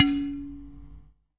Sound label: Clay bowl, ETHNIC and WORLD PERCUSSION; Clay Pots